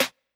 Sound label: Open Snares